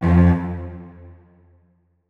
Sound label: notes, keyboard; Hideout Furniture